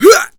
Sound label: Melee, Melee Grunts, Human Punk; zps; Humans